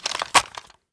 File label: m4 draw, weapons